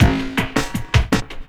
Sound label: LOOP, 160 BPM, Drum & Bass